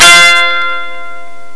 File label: Power Chord